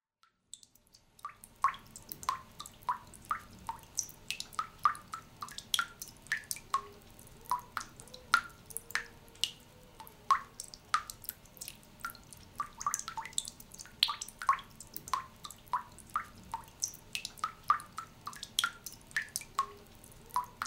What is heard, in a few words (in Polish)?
kapiaca woda z kranu